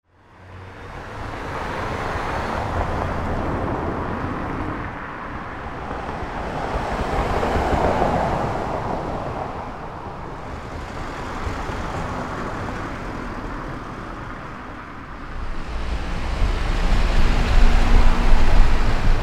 Concepcion Barrio Norte, ruido

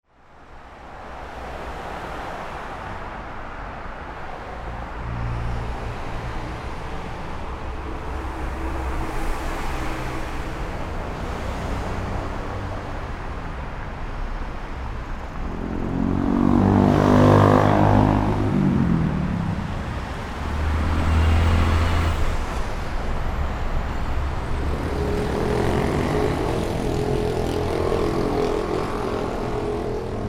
Concepcion Centro, ruido

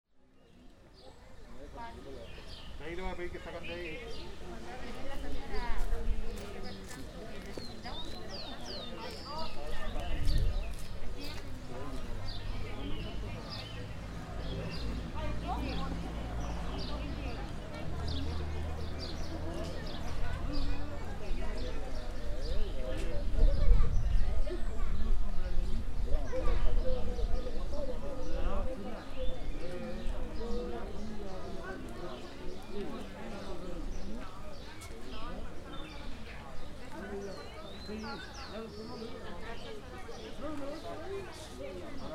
Cerro Navia, ruido

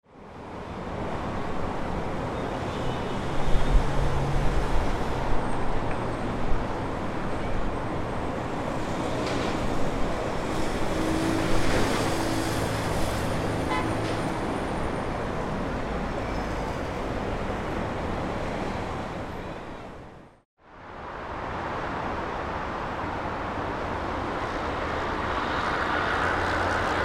Estacion El Bosque, ruido

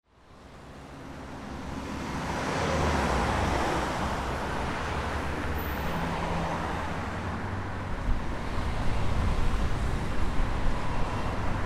San Miguel, ruido

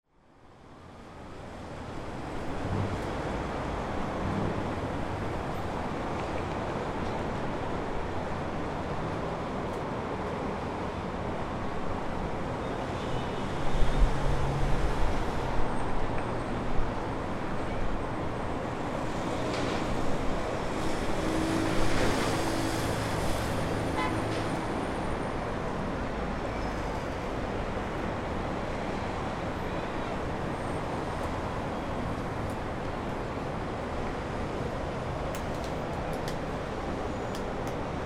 Alameda, ruido

